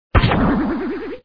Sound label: Impact; MarioImpact; Super Mario 63